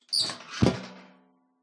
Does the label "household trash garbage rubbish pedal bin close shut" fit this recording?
Yes